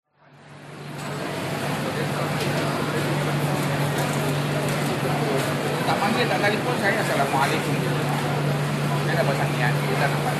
market indoor people veg stalls birds little india singapore; tekka